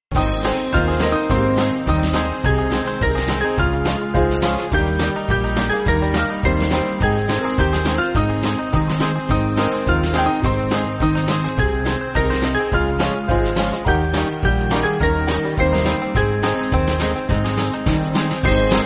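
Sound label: Band, Music Backing Files for Churches; Come To The Saviour Make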